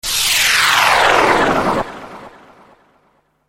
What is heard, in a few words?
wooshe